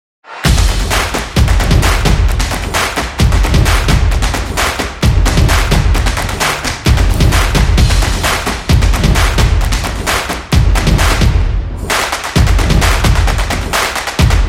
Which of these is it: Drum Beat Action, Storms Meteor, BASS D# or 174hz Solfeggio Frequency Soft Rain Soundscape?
Drum Beat Action